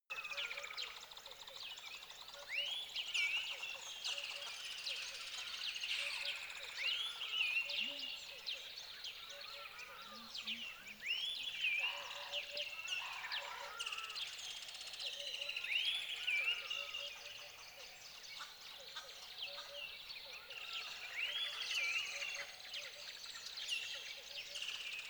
tropical river lively calm wildlife

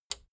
plastic button small latch push

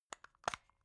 plastic remote battery compartment open

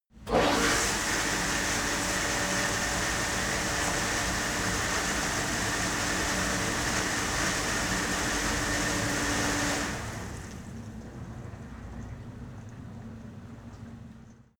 cleaning machine start running stop

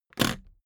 suitcase latch lock jump open hard

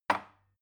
glass put down cupboard

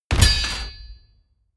medieval construction complete

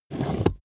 plastic slide small

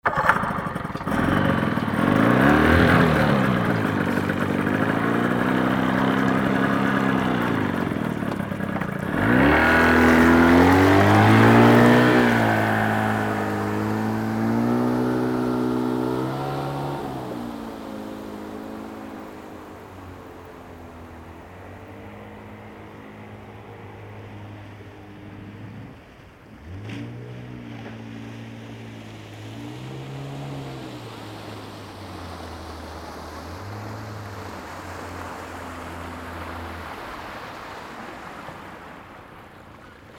boat leave and return

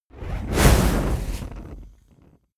whoosh fire ignite small

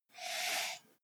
computer mouse draw

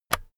keyboard external key release

